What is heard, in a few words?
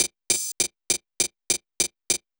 FK BEAT, FUNK; USB Soundscan vol.47; AKAI; Hip-Hop & R&B